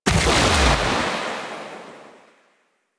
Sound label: Rifle Shot Alt; Weapons; Warframe; Thanotech